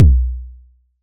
Toms Low

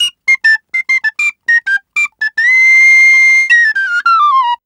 Penny 103bpm F, Penny Whistle; African; Quazibeat; African Secret

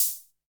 hihat, Novation Bass Station 2

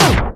SFX TAPESTOP; E-MU Formula 4000 Series Vol. 5; Protozoa; Phatt Instrmt

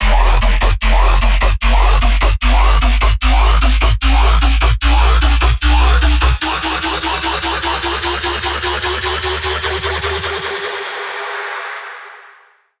bass loop heavy riser 150 E; Virtual Riot

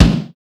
Machine Kick; Drums; Kicks

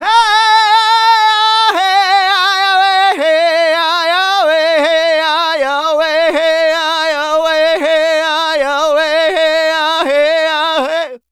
Native Vocals, Native American

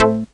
chord housecall A, Chord Shots, Chicago House; Classic Chicago House

